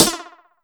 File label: HH CLOSED, Disco House